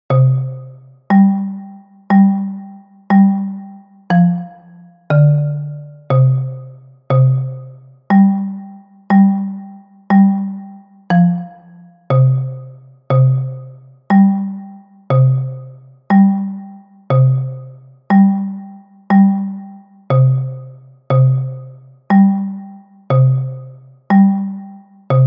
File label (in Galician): Xilofon Baixo; XILÓFONO BAIXO